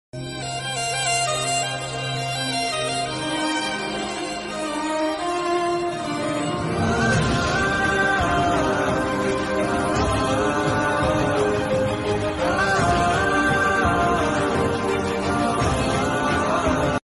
love emotional bgm ringtone sad ringtone emotional ringtone; dhruva; ram charan